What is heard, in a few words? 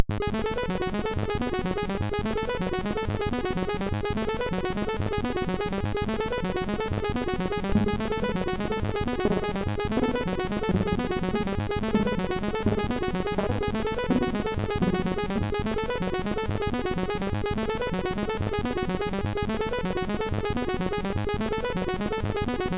weird; SIDTunes; Worktunes